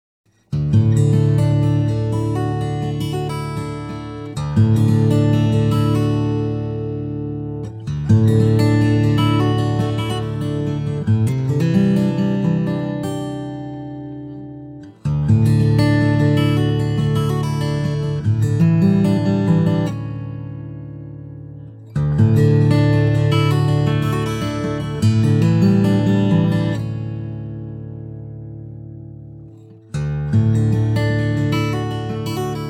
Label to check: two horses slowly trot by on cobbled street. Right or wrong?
Wrong